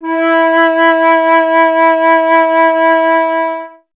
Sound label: flute E; Miroslav Vitous - Solo Instruments VOL-2; Solo lnst. II